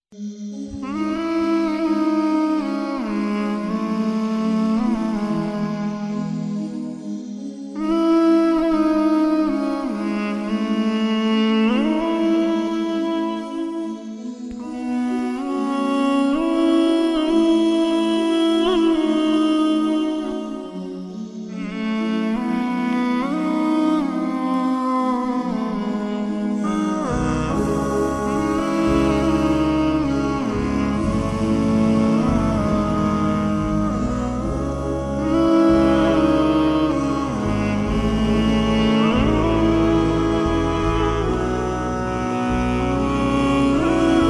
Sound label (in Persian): Vocal Shoor